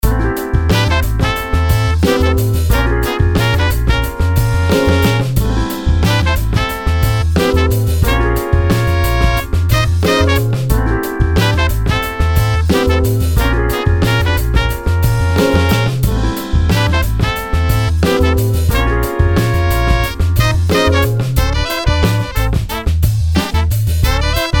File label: Tripple sax